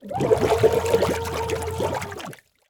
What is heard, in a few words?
Liquid Water Water Scream Under Water